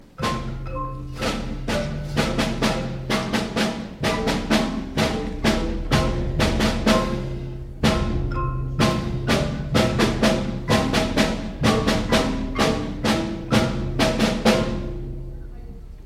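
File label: Percussion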